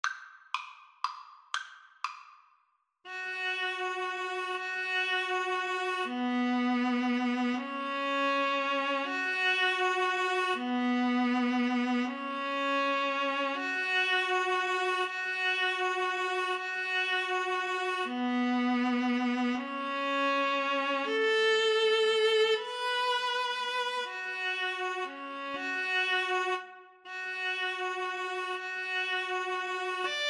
Violin Viola Duet

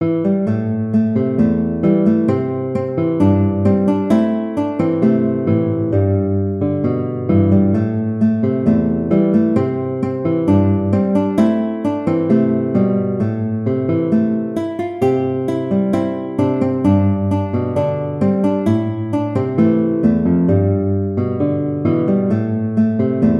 GTR

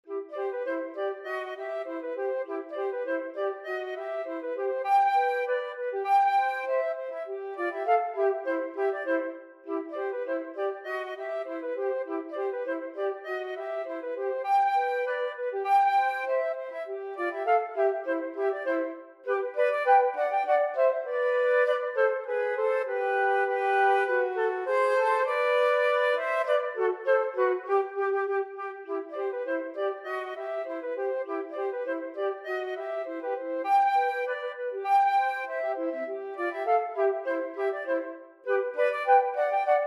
Birds Galopade, flute duet

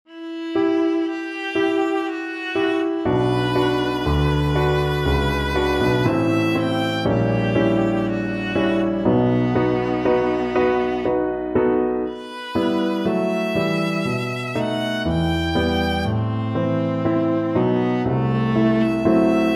viola

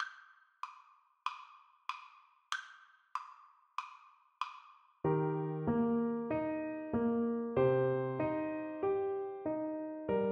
Oboe

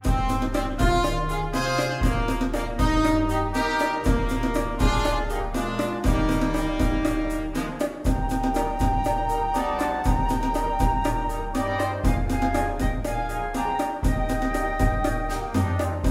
flexible mixed ensemble 8 players

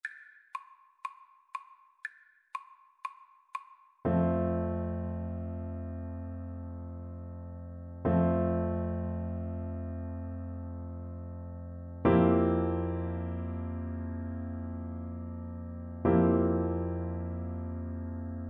dvorak largo, Viola

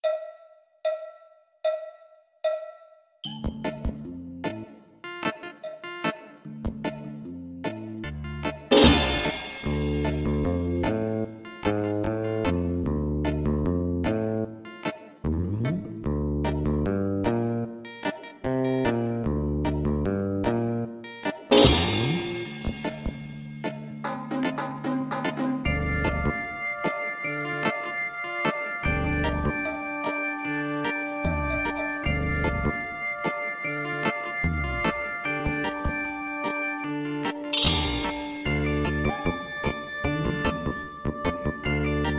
horn, French Horn

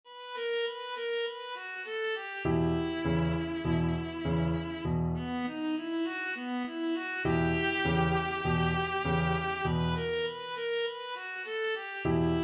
boogie viola

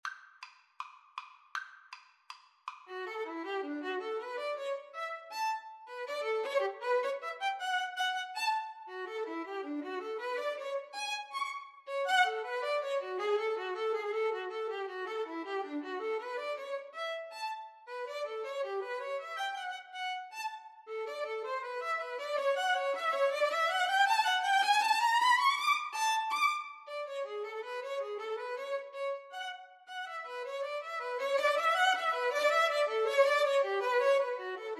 pizziccati violin

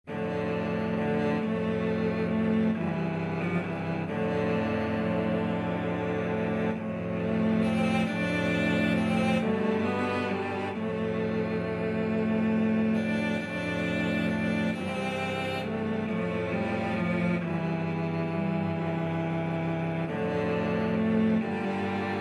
cello quartet

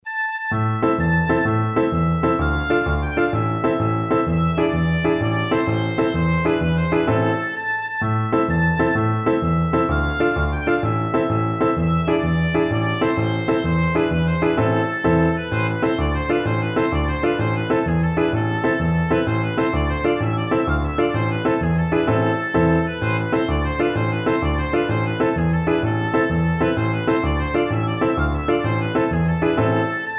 tarantella, oboe

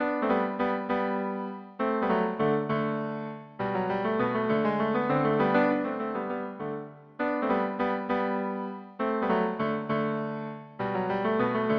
duos, bassoon duet

